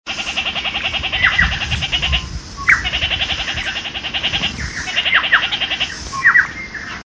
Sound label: red fronted parakeet